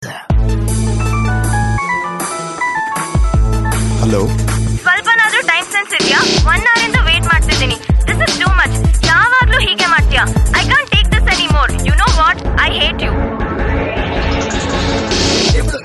Nokia Kannada

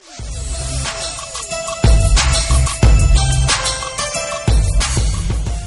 Christmas Ring Tones

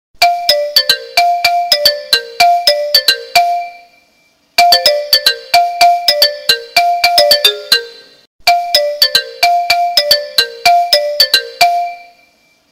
Vibertone

